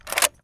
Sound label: rem700 bolt; Escape from Tarkov